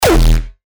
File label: kick; misskey; noizenecio